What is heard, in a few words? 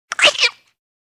Monkey Alarmed